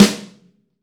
SN, LA SNARE, Snare

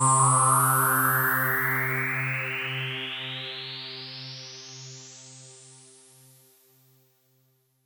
Synth C, Synth Prophet One Shots; Electronisounds

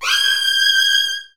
Virtuoso Strings, G4; ILIO; Kirk Hunter Virtuoso Strings VOL-1

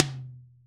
tom, Roland MC-909

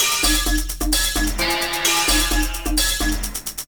times arrow, Deep House 130bpm

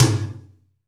ROOM TOM; EdgeSounds; Drum Mashines; R-8 DRUMS

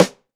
DRY RIM, STUDIO DRY SNARE

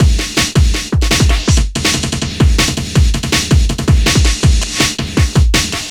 Drum Bass, Electronica; Sonic Foundry; Electronic Point Blank; Drums & Percussion

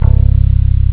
pick bass; jazz jackrabbit 2; M8

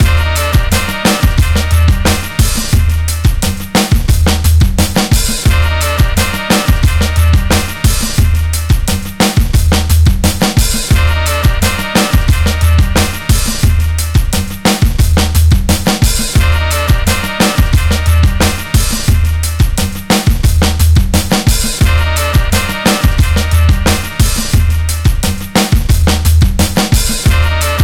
26 DEEP JOY 88 bpm, Loops Breaks; POTBreaks

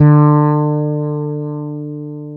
FRETLESS D3, BASS; Northstar - New Gold VOL-2

